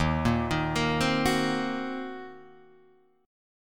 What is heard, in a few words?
Eb Minor 6th Eb down Guitar DADGAD; arpeggiated